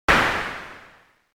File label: noise ping